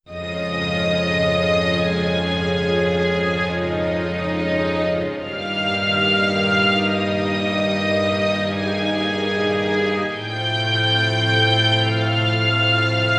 Theme Opening; Maachis

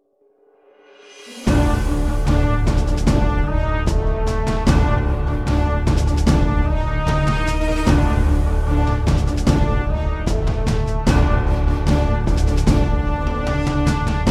Background Music

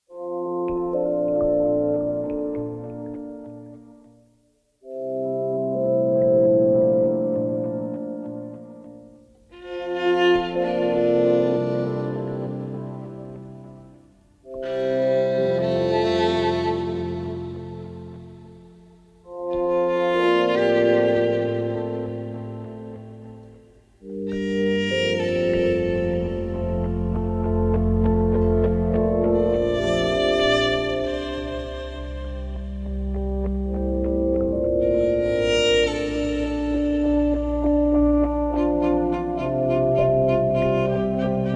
ARP Violin Mix

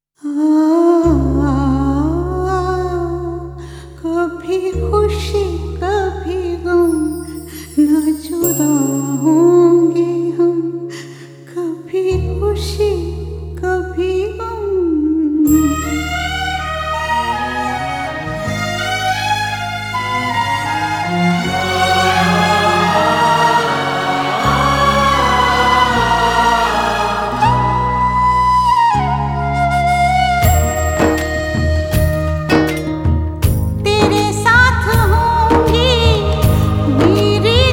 Sad Version

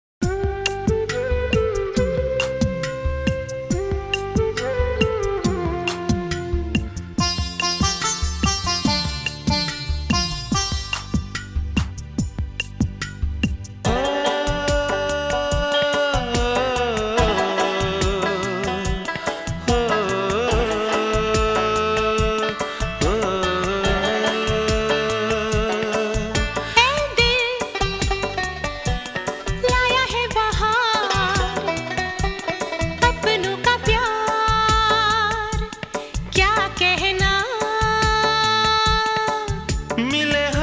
Slow Version

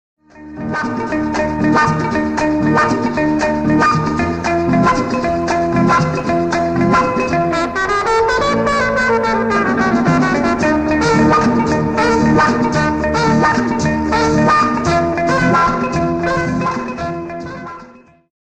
Background Music

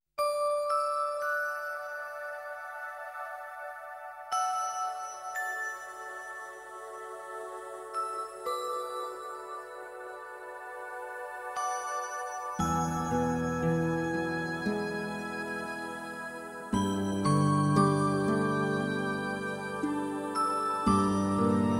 (Instrumental)

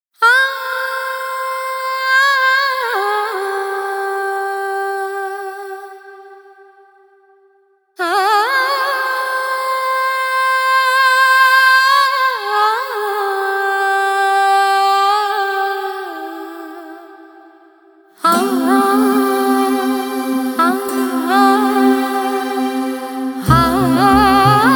Alapana

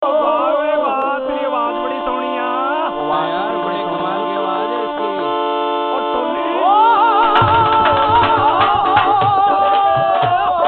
Jingle Bells

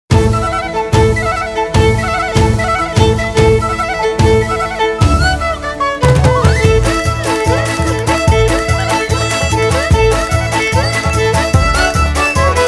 Spirit of Veer (Instrumental)

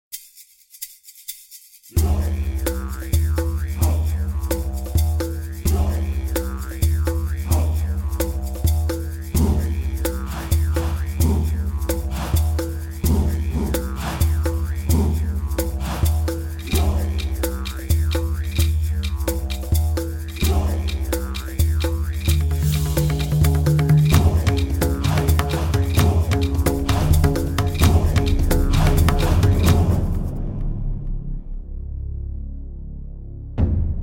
Background Music

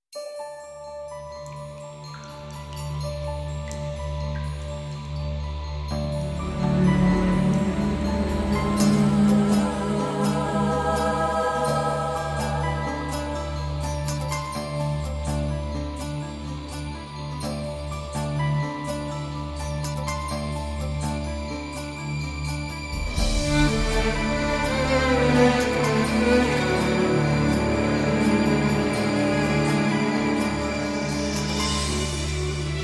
(Instrumental)

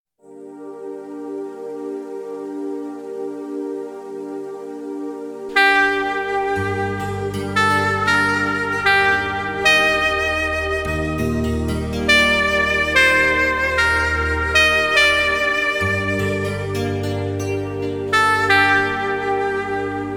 Theme Music

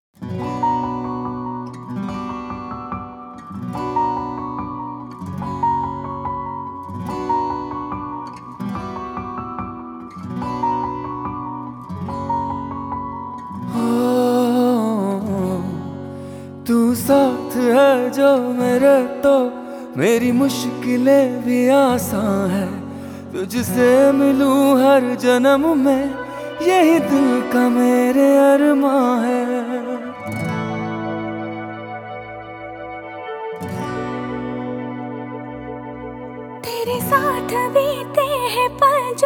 Sad Version

